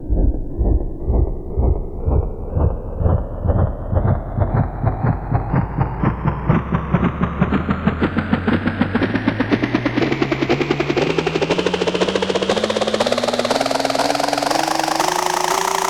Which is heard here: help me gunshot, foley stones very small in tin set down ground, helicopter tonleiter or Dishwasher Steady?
helicopter tonleiter